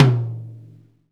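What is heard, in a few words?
tom mid, Roland; Club-50 - Foundations Roland; Ext.Snare 2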